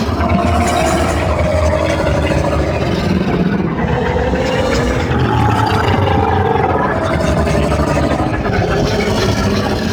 Maw Fishing Move Loop; Warframe; Duviri

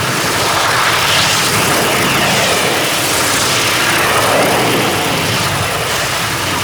Vortex Loop; Trapper

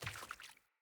Sandals Walk Water, footsteps; Player

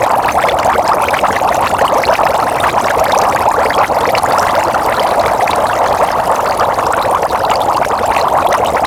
Ocean Bubbles Surface Loop; Ambience; Props; Warframe; Grineer Ocean

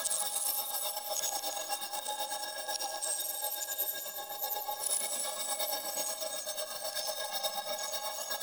Fairy Idle Loop; Warframe

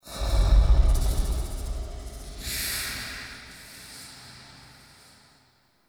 Interior Texture Large Moan, Infested Eris; Ambience; Misc Indoor; Warframe

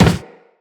Run Wood, Footsteps; Operator

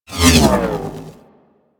Crp Space Figter Laser Agent Fire Whiz By C; Space Fighter Laser Agent

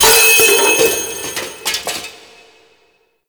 mask knock off; Deacon; Warframe; The New War

